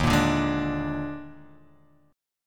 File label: Eb Major 7th Suspended 4th Eb, Guitar, Drop D